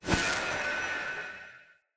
Energy Blade Summon Release; Weapons; Swords

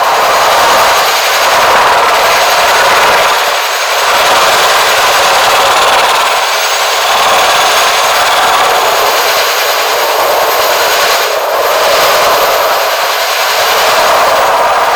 Jetpack Loop; Warframe; Corpus

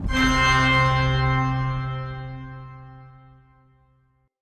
Organ Chord Note B Sharp; Polluter Organ

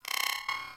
Beep; Warframe; Corpus

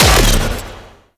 Air Pistol Projectile Explosion; Crp Air Pistol

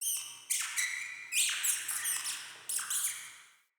Cave Mine Rat

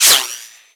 Bullet Whizzby; Warframe; Bullet Impacts; Whizzbys

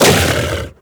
Kubrow Armored Vocal Attack Short; Warframe

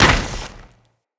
Moa Combined Step Snow, Footsteps

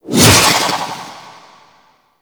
Sword Board Charge Throw; Warframe; Tenno

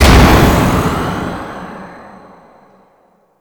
Reckoning Impact; Warframe; Paladin

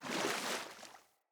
Surface Body Roll Water; Characters; Foley; Surface Body Impacts

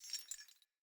Grunt Foley Movement Chains

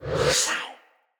Staff Caster Cast Short; Weapons; Staves; Wazzard Staff Caster

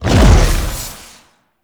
Howl Jump; Warframe; Voruna

